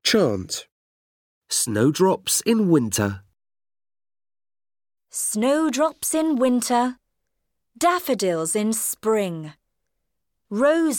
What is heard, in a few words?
Chant Snowdrops in winter